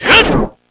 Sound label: saber slash